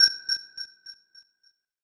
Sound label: Nav Buoy verb